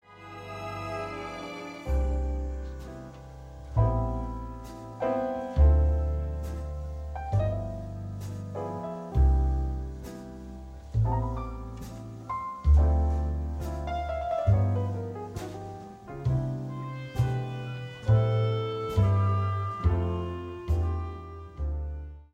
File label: fragment, altosax